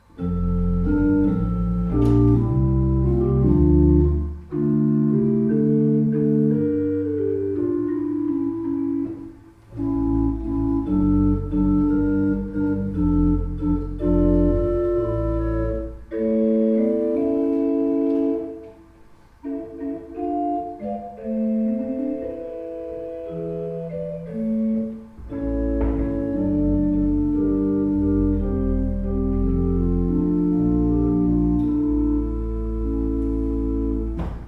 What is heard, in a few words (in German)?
Walcker, gebrauchtorgel